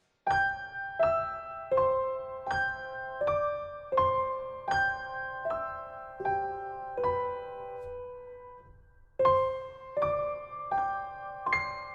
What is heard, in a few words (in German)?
Instrumental